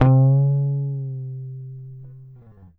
GTR TRIK, Jazzistic; Univers Sons

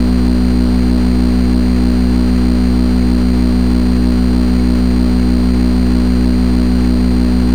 Drone Static; Drums & Drones

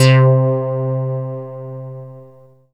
METLB, synthesizer bass; Synthesizers; Soli-Music; World of Synthesizers VOL-1

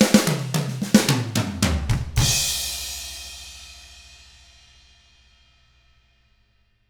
POP ROCK, Drums; ILIO; Double Platinum Drums 1

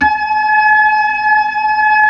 B-3, Blues

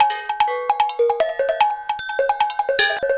Bell Outside, Percussion Loops

